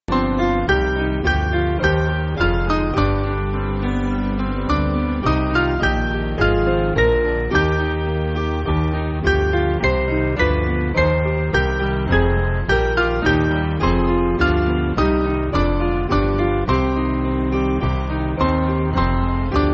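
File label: Piano, Music Backing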